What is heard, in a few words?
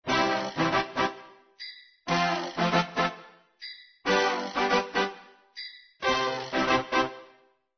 Upbeat; RoboTutor